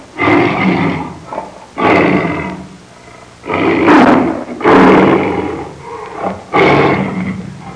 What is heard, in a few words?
Roaring tigress